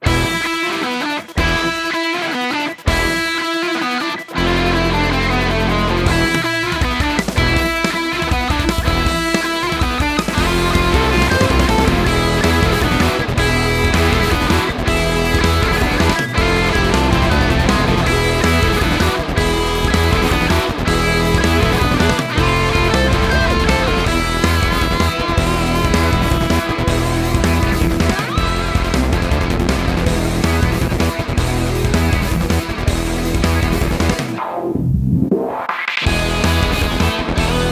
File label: Boss Fight No vocals; Spark the Electric Jester 2